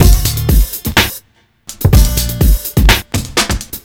Old School Break, Drums

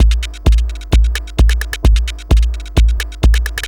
Dance Drumloop; Drums; Dance Beats

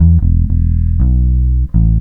Electric Bass; Basses

